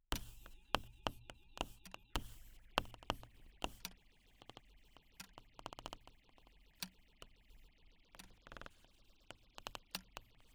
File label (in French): Tous les stems de la version « Source », état initial